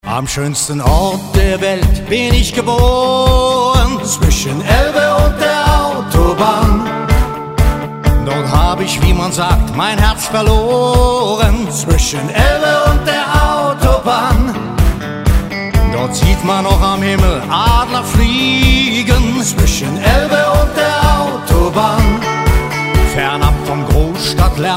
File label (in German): Country-Version